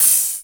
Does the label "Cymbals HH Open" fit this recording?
Yes